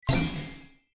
Scatter In Reel; goldenClover

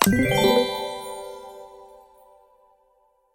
Select Game

